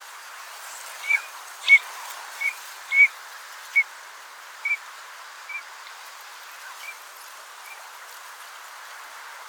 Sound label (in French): sarcelle d hiver, chant